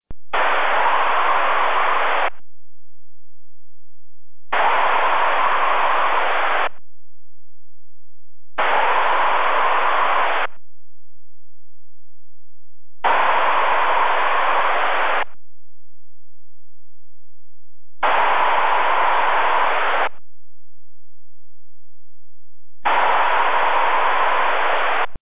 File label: own echoes 24GHz